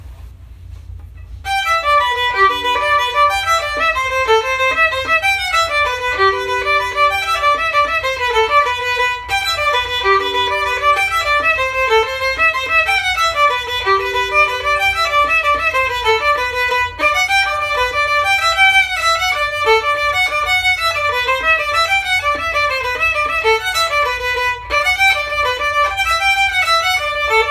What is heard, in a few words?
Irish Washerwoman Harmony